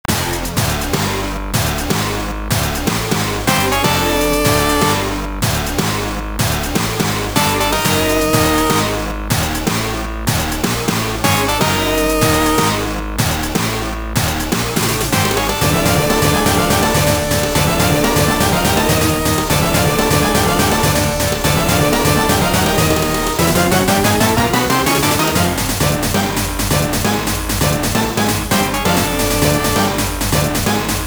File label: Boss 2; Creature from Outer Space; Super Contra; arcade